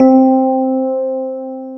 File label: JV SENSITIVE, JV-1080, PIANO; Syntec; Wall of Sounds